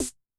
Analog H, Yamaha DTXpress-II snare

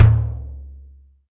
DM5 Tom Hi, Alesis DM5 drum machine

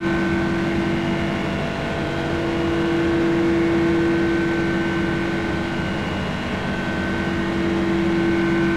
MACH, MACH N SM; Total Control - Action Cutz

